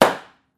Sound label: Bang Clean